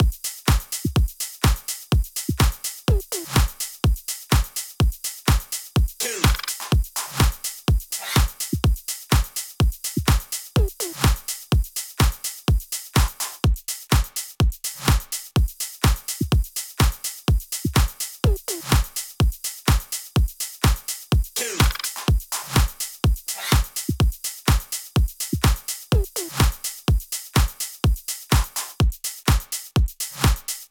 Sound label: drumloop 125